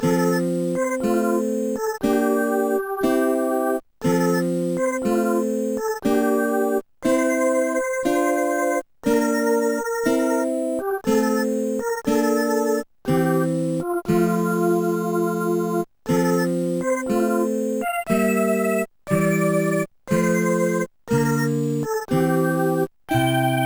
air; Deluxe Music Construction Set

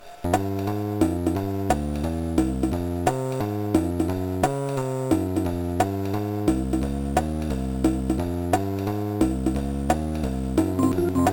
jazz; Sonix Music Driver

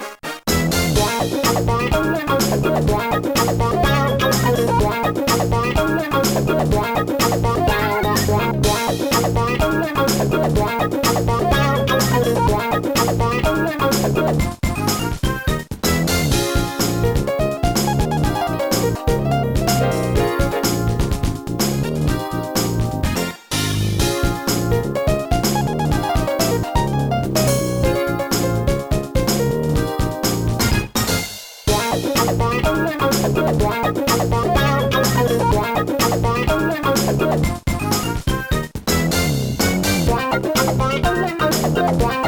guitar funk!

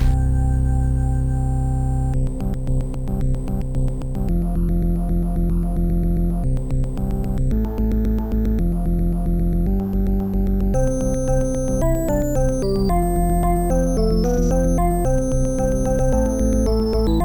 modem music; Sonix Music Driver

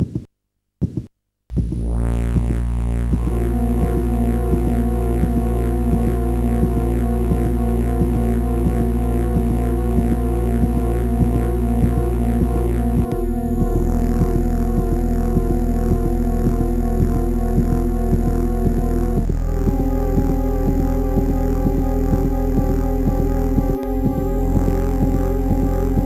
heartbeat music; Example Modules; Rise Of The Dragon

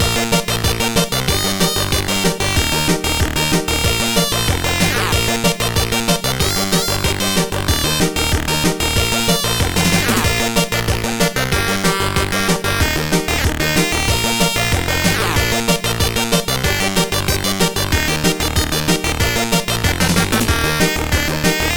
burble gurgle

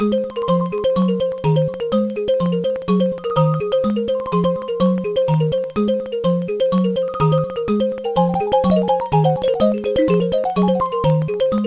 kalimba interlude; Dime; Trackers; Modland

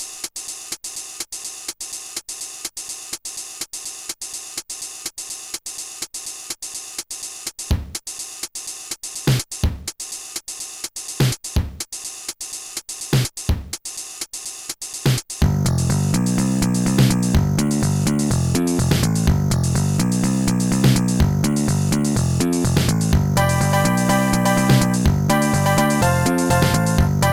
i like jazz; MED

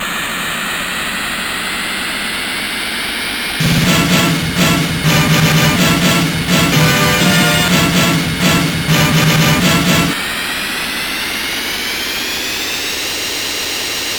takeoff jingle, Trackers; Modland; Rogue Male

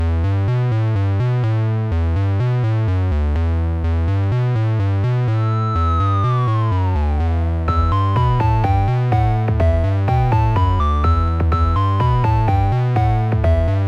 drummer boy ii; MusicLine

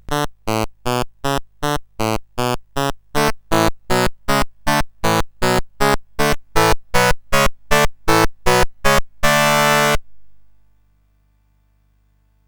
Cheer, CSG8580R5; Imagineering Inc

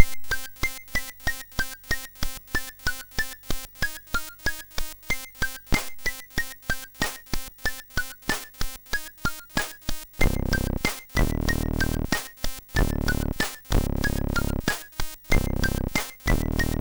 Rap

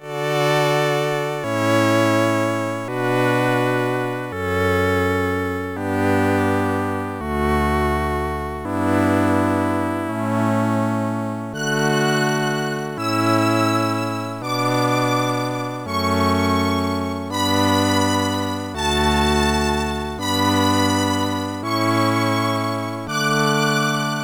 canon

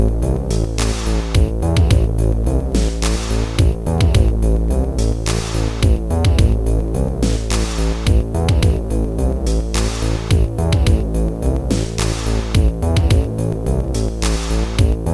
the drum song; MusicLine

